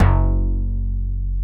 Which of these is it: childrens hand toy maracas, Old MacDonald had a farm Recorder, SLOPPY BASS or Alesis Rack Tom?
SLOPPY BASS